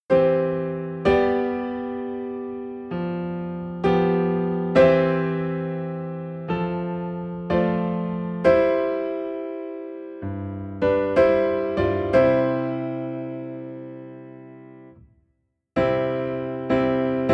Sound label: Piano in C; christmas; O Holy Night